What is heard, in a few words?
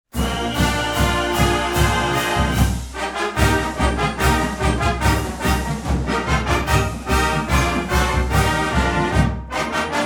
Fight Song Music